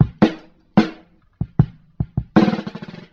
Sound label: fuzzy rolls, funky drum loops